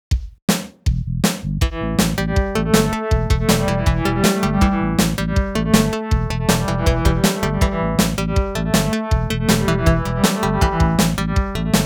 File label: rock